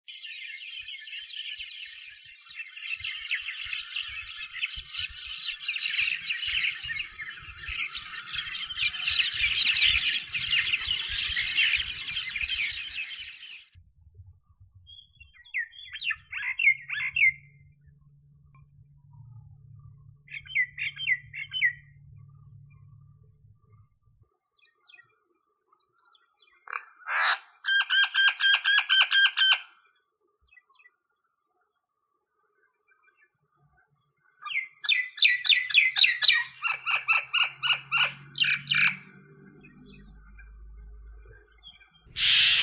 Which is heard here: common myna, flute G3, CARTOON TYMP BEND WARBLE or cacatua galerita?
common myna